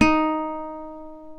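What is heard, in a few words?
D#3 FLAMENCO, FLAMENCO guitar; WorldSounds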